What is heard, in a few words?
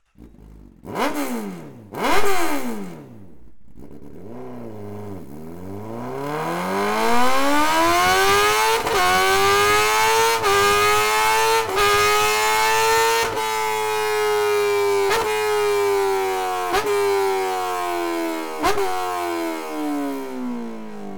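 complete wo insert, exhaust; akrapovic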